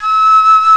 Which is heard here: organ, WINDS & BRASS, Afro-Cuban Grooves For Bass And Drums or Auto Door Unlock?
WINDS & BRASS